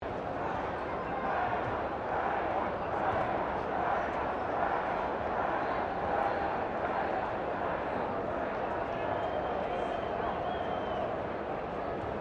Crowd 40K Ambience Chanting, Cricket at Melbourne Cricket Ground; Mcg; Backgrounds; Crowds